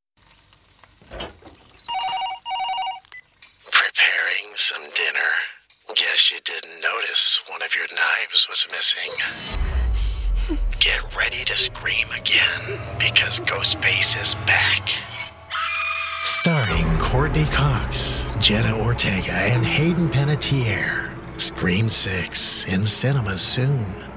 scream

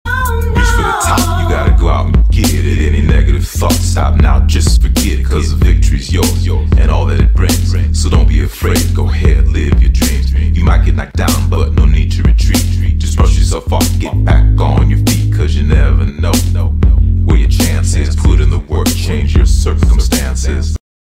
Hip Hop

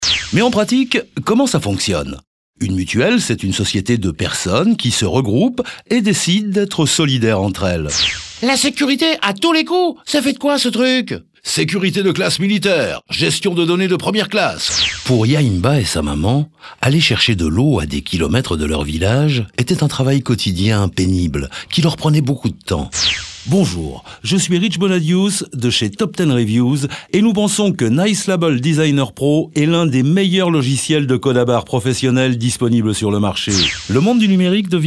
a capella; authentic French European voice over

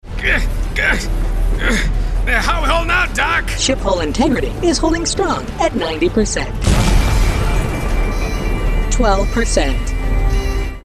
Space Ship Battle

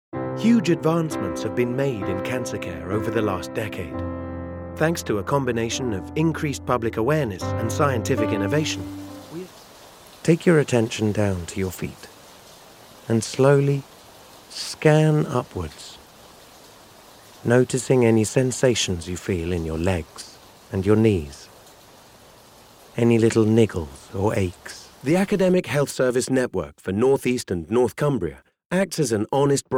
MIXED VOICES AND SONGS

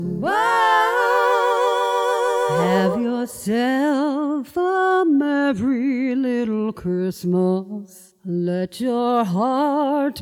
merry song

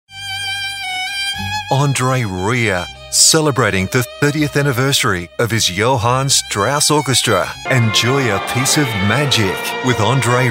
Orchestra

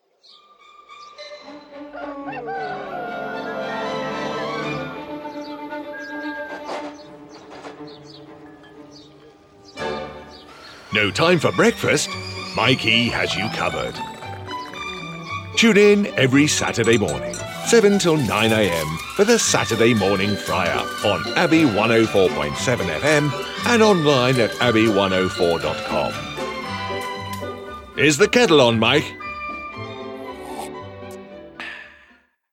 Jingle; Saturday Morning Fry-Up

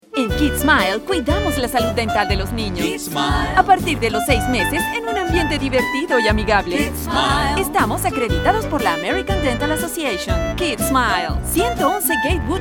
JIngle; KIDSMILE

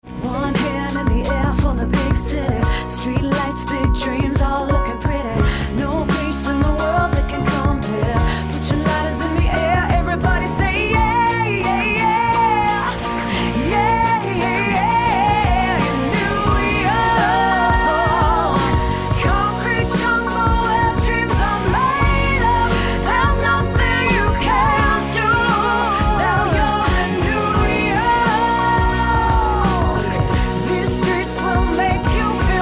VOCALIST Rock Pop RnB

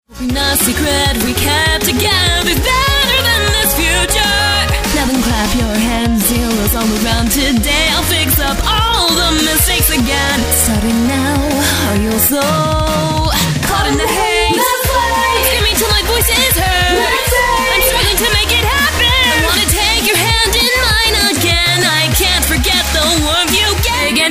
Singing

